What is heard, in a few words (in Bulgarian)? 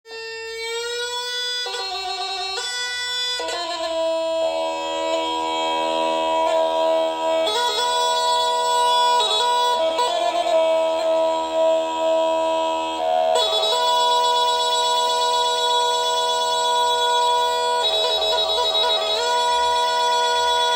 Родопска бавна мелодия и хоро